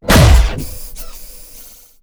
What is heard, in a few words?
hurt, cylarkan, creatures; CosmicRage; general combat